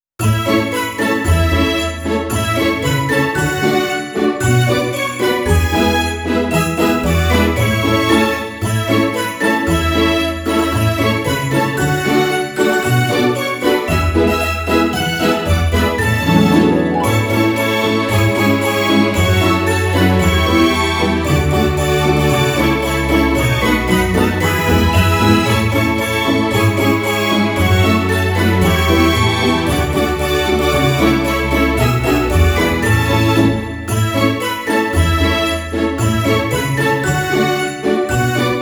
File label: Jingle Bells; Cookie Run Ovenbreak; winter collection